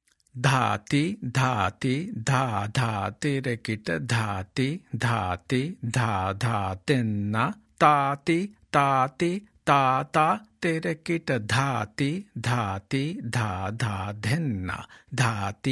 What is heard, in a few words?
dilli kayda